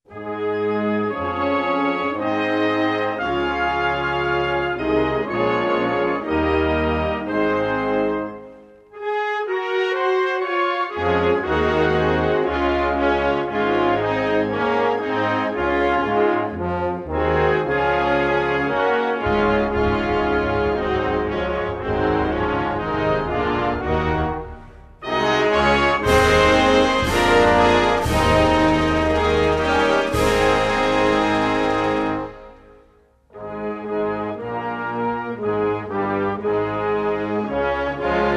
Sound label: Iceland National Anthem